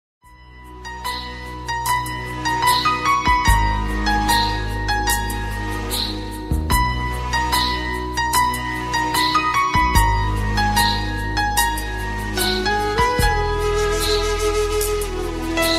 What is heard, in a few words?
old bollywood instrumental song ringtone